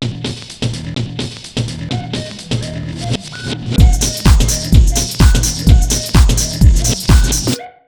Fresh Disco House, 127 BEAT; USB Soundscan vol.20; AKAI

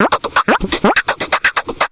Processed Vocal Loops; Houseworx

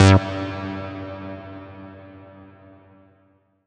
Quip Stab, Old Skool House; Big Fish Audio; OSH Chords

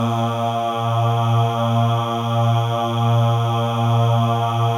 MALE AHS, Voices; Spectrasonics

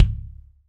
BD DRY, Drums; Best Service; Real Mega Drums

